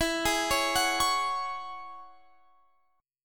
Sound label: G sharp 7th Suspended 4th Sharp 5th